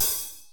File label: hihat, Drums, Kurzweil K2000